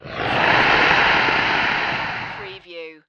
Monster Snarl Hiss

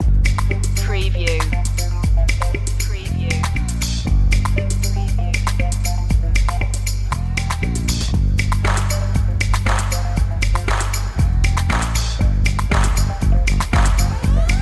funky bag loop

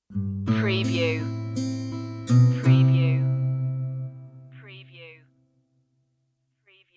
stinger

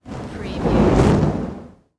Torch Pass By Whoosh

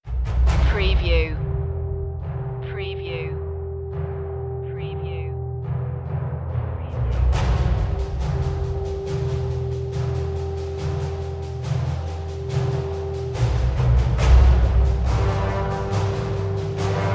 brass perc only

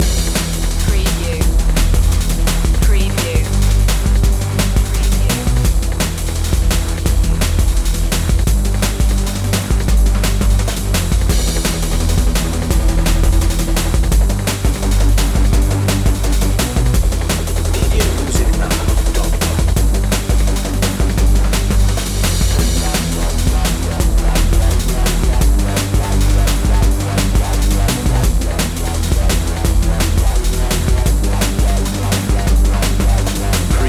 deep pulse loop

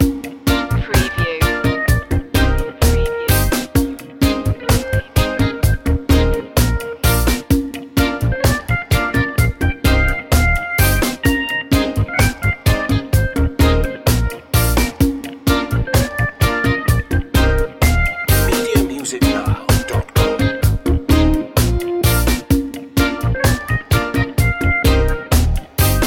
reggae thing loop

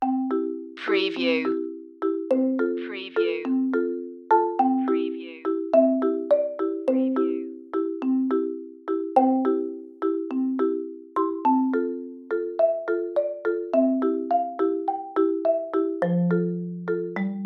first steps no piano no glock

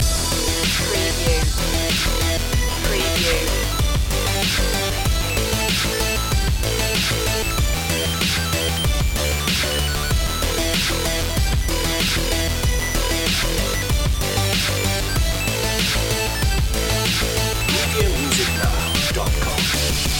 8-bit fat groove loop

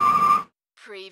Car or motorcycle brake, tyre screech

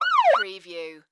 Police Siren Short; Vehicle / Transport Sounds / Emergency Vehicles

